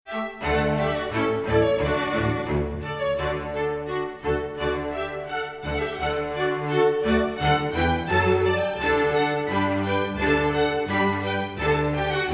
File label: toccata; Grand Mesa Strings